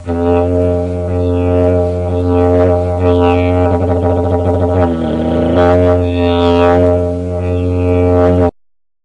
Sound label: didj